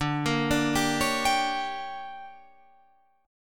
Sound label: D Major 7th Suspended 4th Sharp 5th, Guitar Drop D